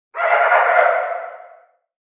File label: Dog Detect Narcotics Distant